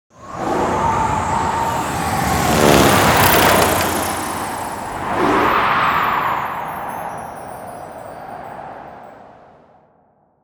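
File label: Ambience Ship Intro Tutorial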